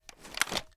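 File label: weapon foley assault rifle raise movement; weapons; gmod; viper; scharlie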